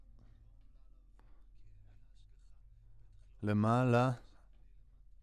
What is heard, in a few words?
Voc OD